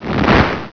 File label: FIRE